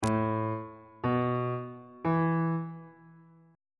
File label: g double sharp suspended 2nd triad chord intervals on bass clef